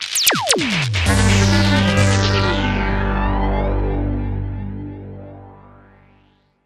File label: Five Kool Sweeps